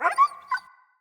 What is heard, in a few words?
Wolf Pain Yelp; Animals